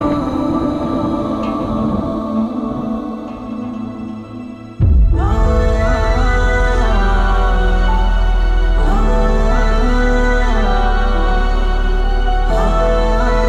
Sad Bgm

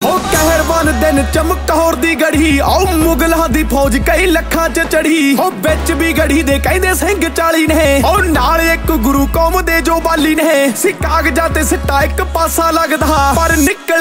Song Punjabi Devotional

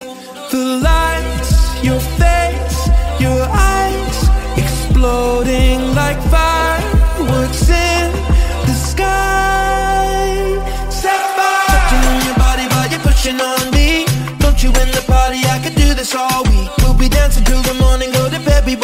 Lofi Slowed Reverb

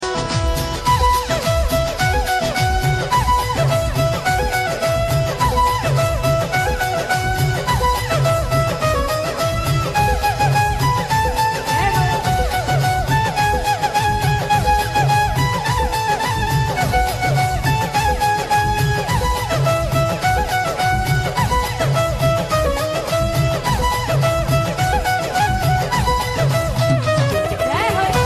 Instrumental

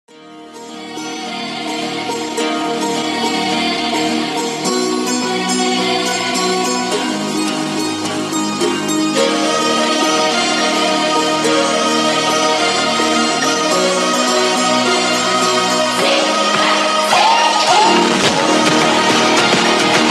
FUNK Bgm

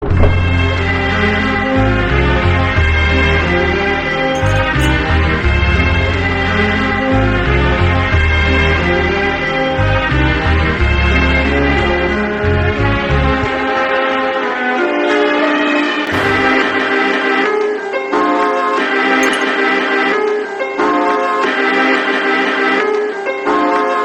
Instrumental

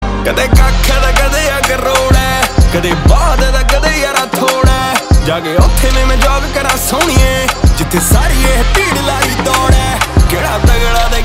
Song Punjabi

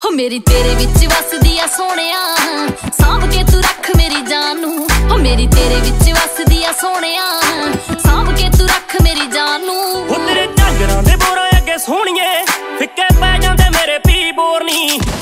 Song, ringtone

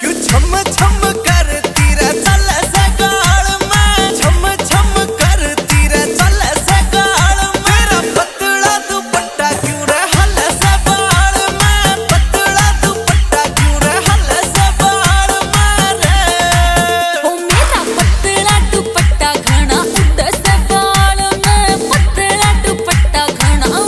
Haryanvi, ringtone

